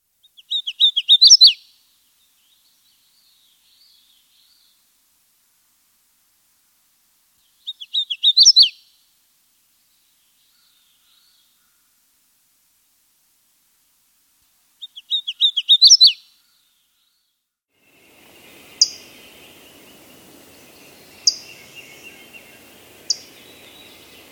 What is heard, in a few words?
Hooded Warbler